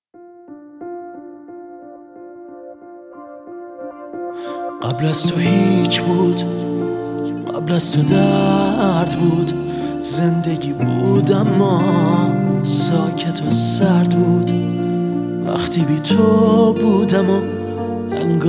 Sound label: Piano Version